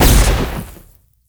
boss sexplo, monster; steamer crazy

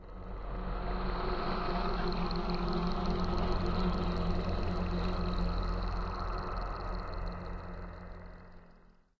laboratory, undeadtower

